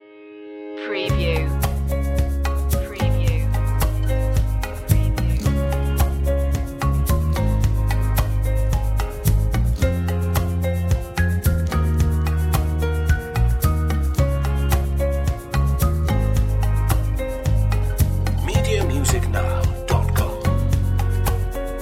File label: sweet tune